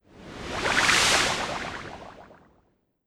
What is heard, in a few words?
Engineer Spell Drone Drop Poison; Legend of Keepers (gamerip) (2019)